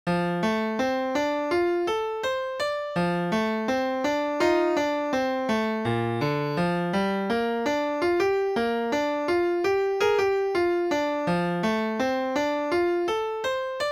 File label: Pizzicato Boogie